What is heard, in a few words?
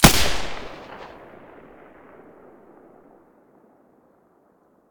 silencer g36 stereo, weapons